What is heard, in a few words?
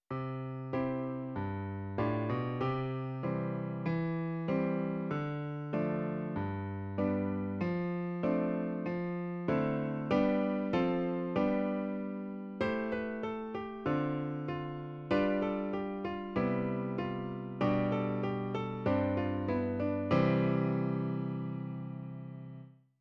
backing C